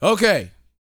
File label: VOX, R&B Dance, HIP-HOP